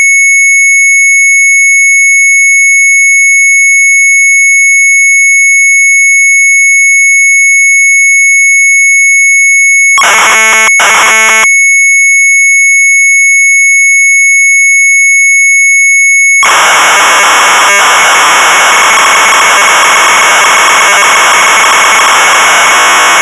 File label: Sharp MZ800 Tape; TAALTRAINER; retromelsarchive